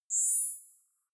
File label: zoom in light